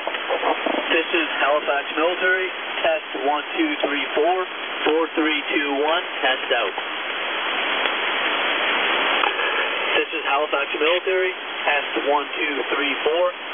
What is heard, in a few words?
Military test; Canadian Military, Halifax; Canadian Mil Bandit; c/s Bandit